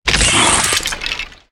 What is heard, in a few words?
Unearthed Raptor Death